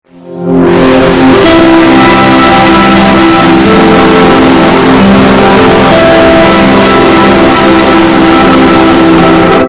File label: ps4 bass